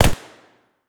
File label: smg, fire; weapons